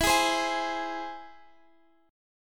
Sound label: E Augmented E